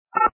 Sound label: DTMF 3